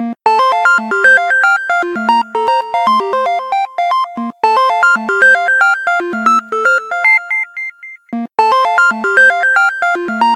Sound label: Lullaby, ringtones